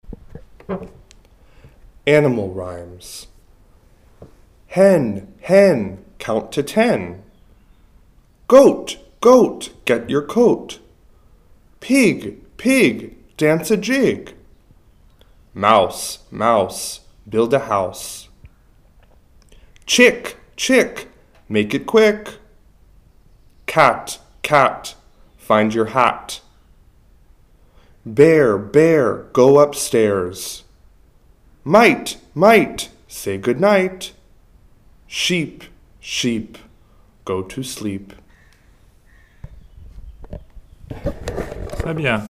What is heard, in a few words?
Comptine Animal Rhymes